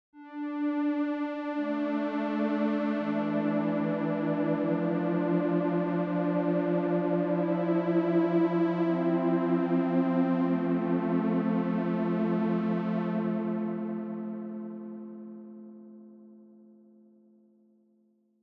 2 Strings Slow LFO Long Decay; U-He Twangstroem; TyrellN6

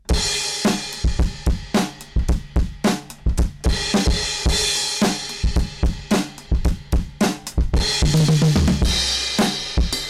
Drums Overdrive; Vermona DSR-3

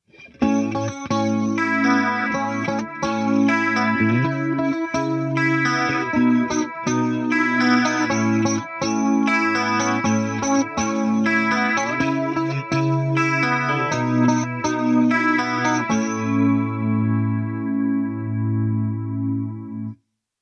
clean modern pop; ToneMasterPro

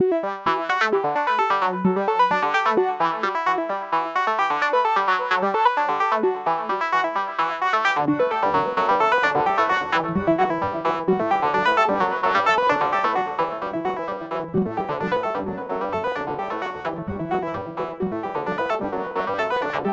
Pitched Synth; sumFX

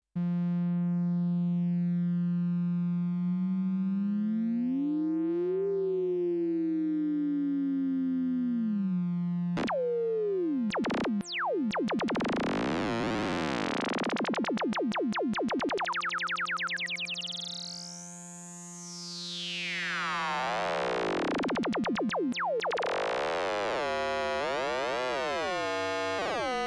super8 Filter FM by ADSR binaural; UDO

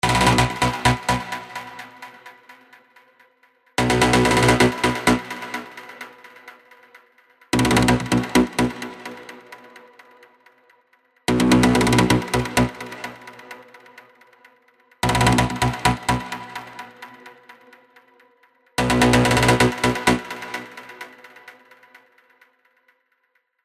BA FM Rattler; Vengeance Sound Avenger 2; Melodic Tech Voices 1

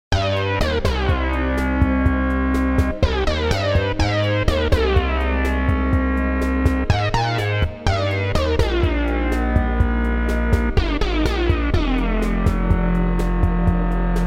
Laserharp; Behringer Kobol

